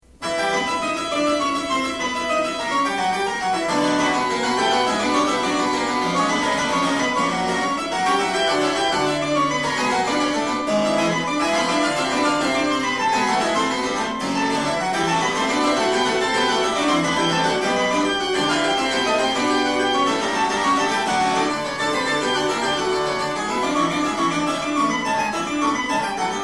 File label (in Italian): fuga; bach; bwv855